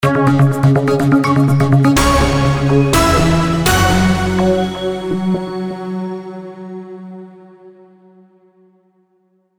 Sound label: News sounder